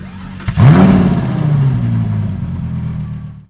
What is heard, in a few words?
Engine Start Sound